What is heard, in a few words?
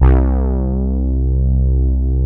Poly800 C1, Korg; Back In Time Records; Korg Universe VOL-1